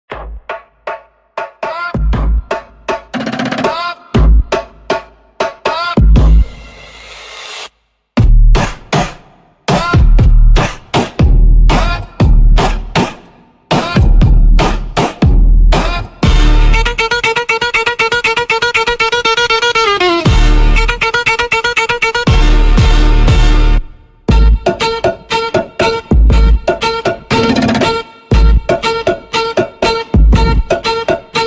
Funk

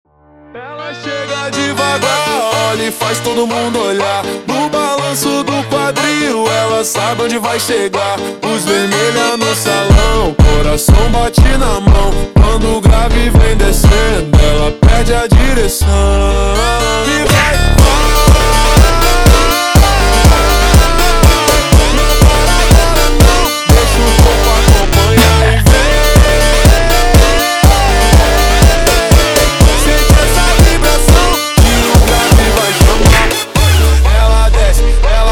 Funk slowed

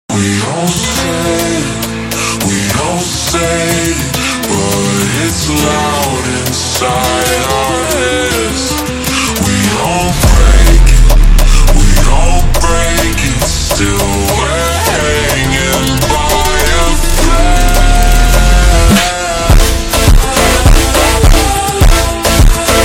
Funk - Ultra Slowed

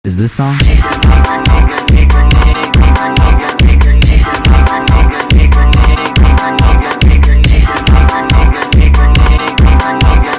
sped Up, funk

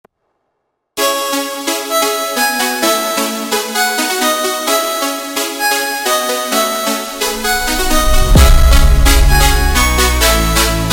instrumental, funk

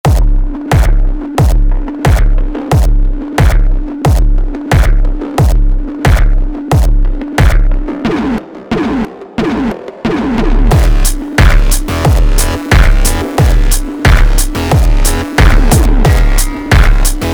funk

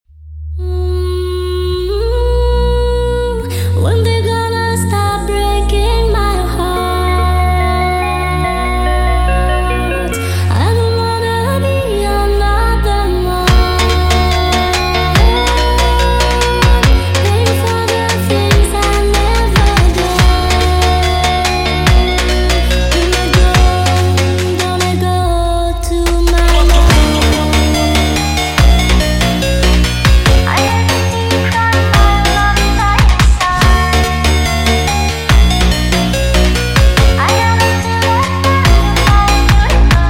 Funk sped Up

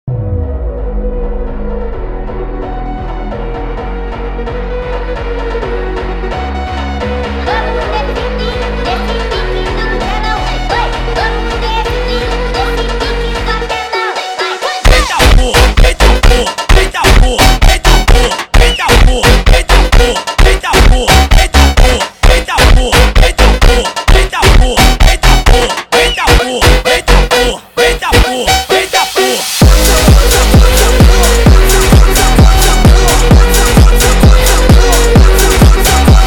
Funk

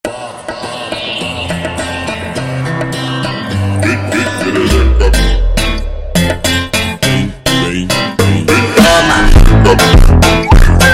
Whistle Funk Super Slowed

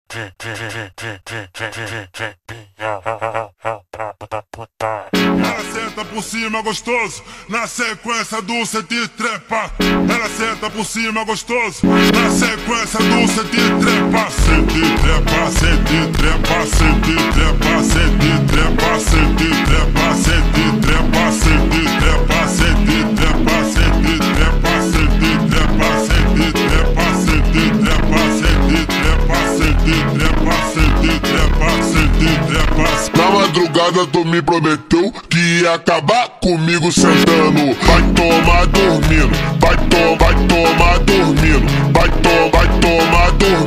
Montagem Vozes Grito super Slowed, funk

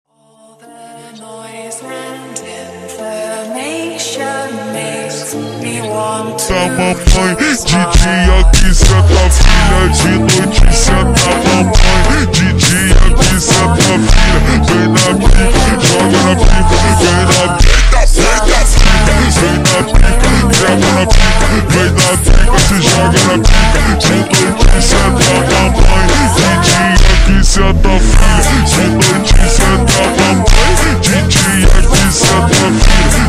Brega Ultra Slowed, funk

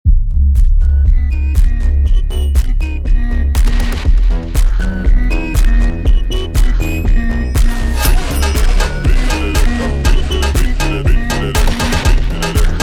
Funk slowed

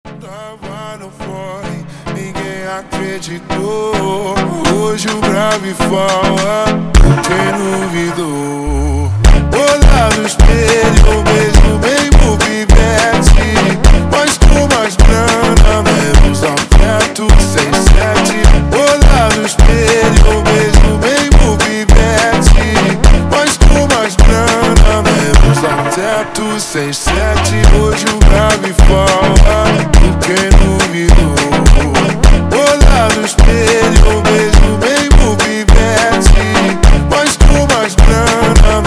slowed, funk

